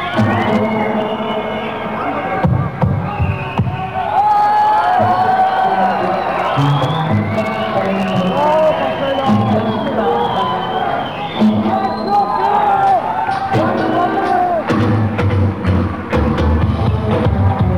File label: (instrumental); Théâtre Mogador, Paris